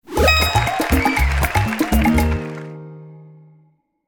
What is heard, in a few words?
Win; Mirabel